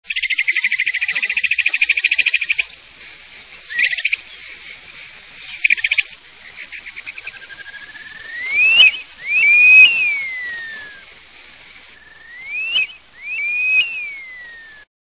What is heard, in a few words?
Upland Sandpiper